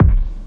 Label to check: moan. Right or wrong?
Wrong